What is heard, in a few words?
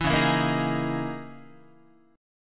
Db Minor 6th Add 9th Db down Guitar; strummed; 4A,4E,5A,5D,5G#,6C#